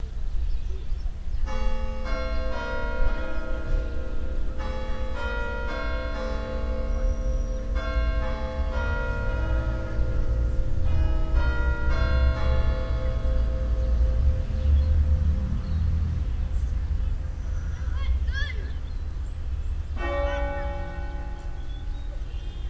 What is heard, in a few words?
Church Bells With Children And Birds